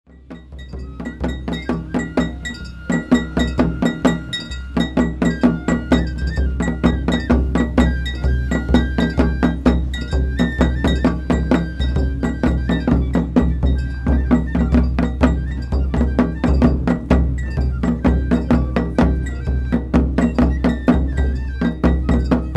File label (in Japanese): 弥生祭お囃子; 弥生祭は日光二荒山神社の例大祭。